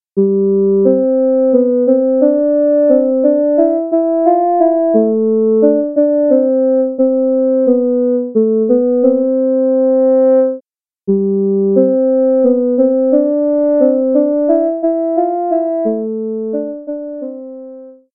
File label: clarinet